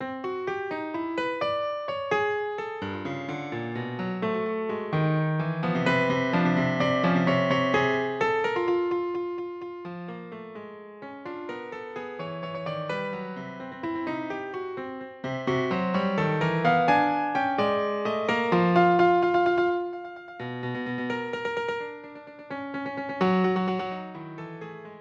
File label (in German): Klavier, Saltarello; 15 szenen fuer klavier